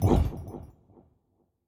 Hurt

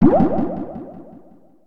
Appear; SE Manzai#24 (SE132 Man Appear)

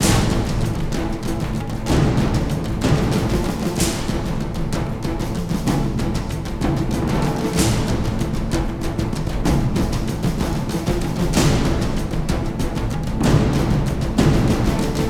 Combat; The Hobbit; PS2

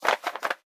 Fly out jump; Tsutsukera

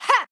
Swipe; Jackie

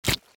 Meat jumps; SFX Meat

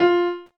VOC PIANO; gamerip; PS3

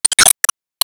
Enemy Glassy Common Delete

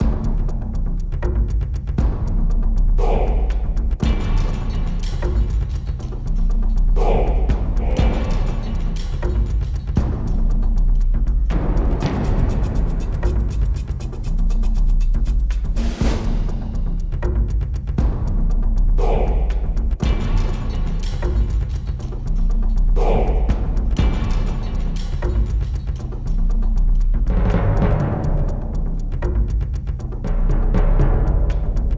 Combat

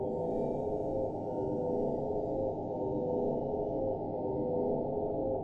TV Store - Entrance (Ambient 2)

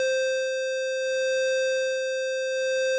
stereo test; Sailor Zombie AKB48 Arcade Edition; Namco System 369; PS3

